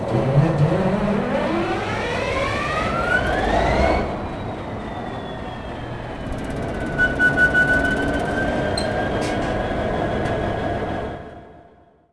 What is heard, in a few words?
engine fire, train, class 66; OpenBVE